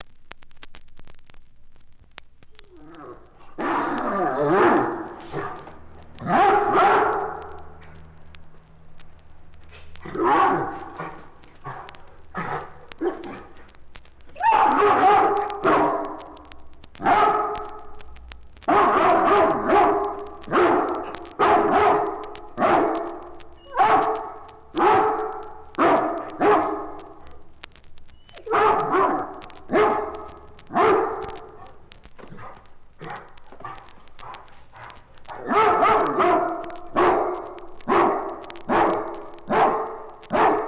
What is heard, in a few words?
Dogs Barking